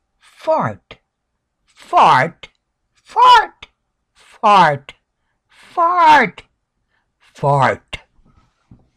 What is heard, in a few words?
fart